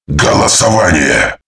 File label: vote start